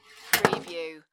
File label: Pinball sound, ball slides of rail and falls to floor